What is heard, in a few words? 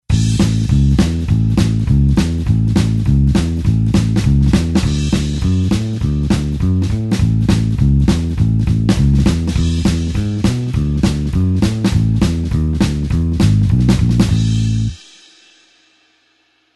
blues bassline 2beat A tempo